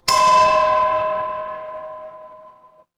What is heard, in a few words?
door doorbell